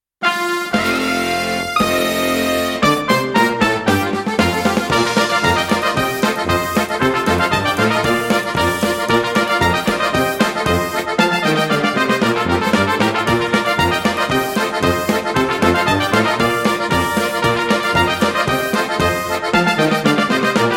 polka; Smoked kielbasa

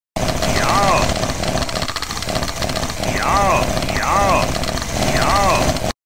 baldi eating a apple

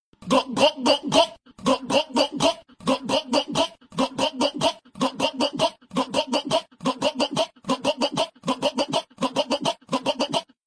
gulp gulp gulp gulp

